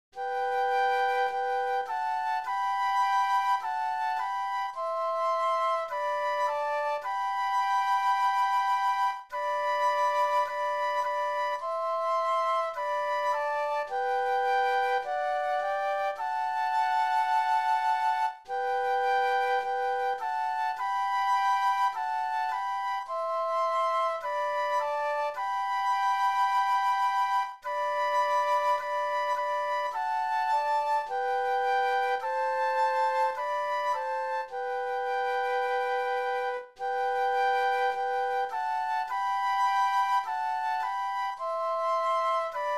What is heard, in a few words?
Flute Duet